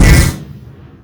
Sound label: power gun; cdogs